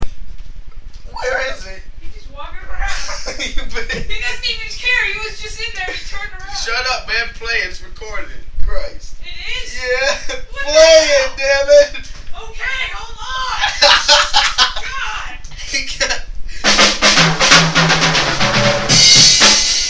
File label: Drum Solo